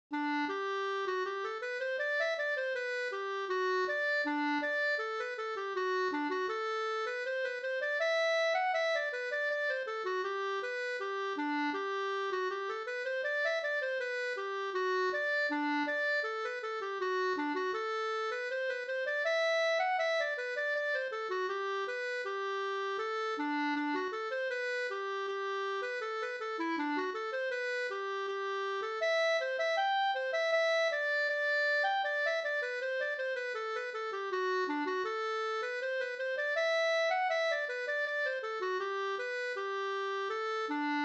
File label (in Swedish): brudmarsch; e Helmer Gustavsson; Farentuna